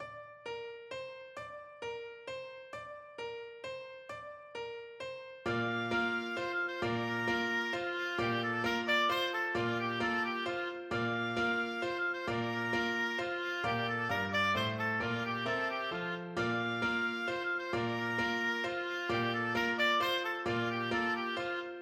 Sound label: Bizet Carillon, oboe